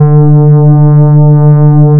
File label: fm cello single; cosine; FFTimbre